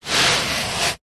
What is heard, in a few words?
foam board slide on surface; zapsplat